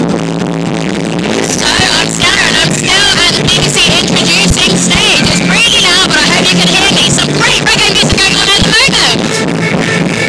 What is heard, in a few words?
reggae